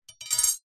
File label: foley metal flat short thin length drop ground; zapsplat